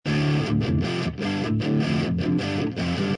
Brit Stack Drop D; Loopology

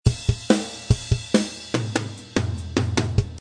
Dirty Rock, Heavy Modern Funk & Rock; Loopology

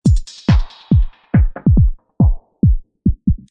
Nice Thump; Loopology; Crying & Dancing; Techno & Dance

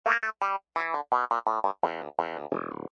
Wah Clav; Funk & Rock; Loopology; Get Dirty

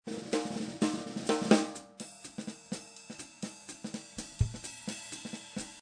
bebop drum 250 BPM; Lounge & Reggae; Loopology; Be Bop Baby

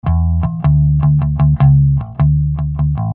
Amped Mod Bass E; Loopology

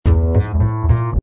upright cookin E; Lounge & Reggae; Loopology; Be Bop Baby

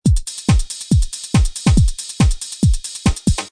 Nice Thump; Loopology; Crying & Dancing; Techno & Dance

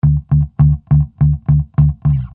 Amped Slick Bass D; Loopology

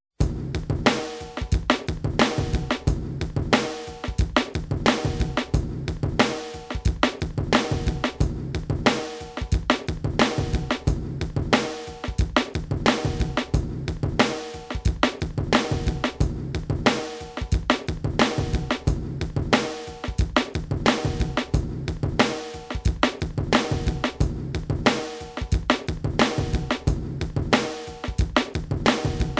Drum Comp, Hip Alterna; Surround Session

